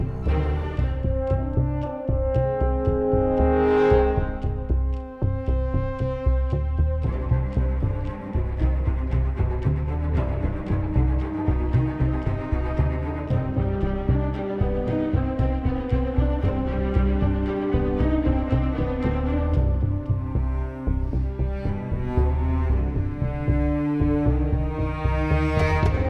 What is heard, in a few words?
String; Assassin's Creed Unity